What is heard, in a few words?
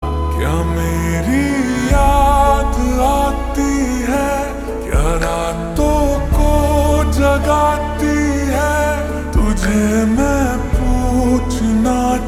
Ringtone Slowed Reverb; sky force